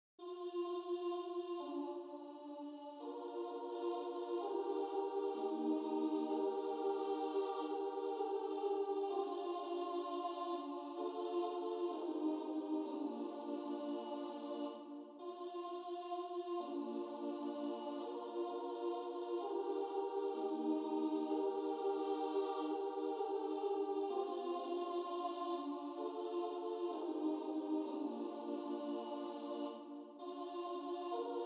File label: Trio; How Great Thou Art